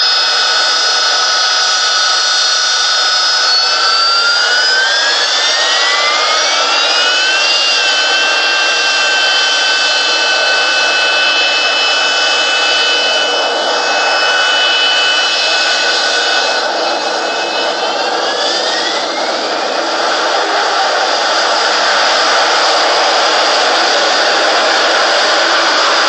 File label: Harrier Vertical ringtone